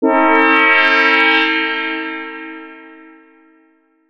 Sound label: horn